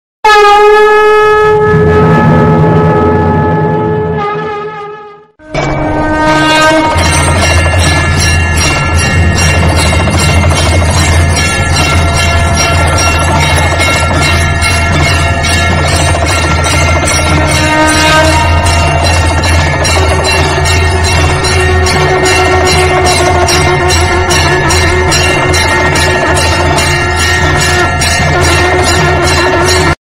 temple bell shankh naad sound effects for worship music